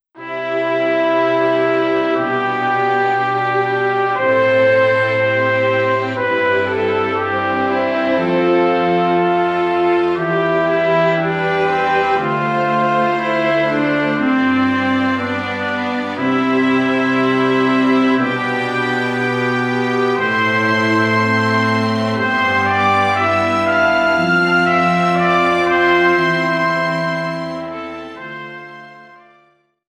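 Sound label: background music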